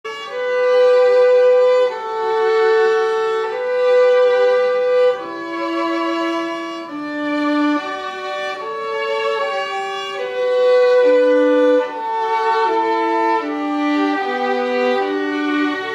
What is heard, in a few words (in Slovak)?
husle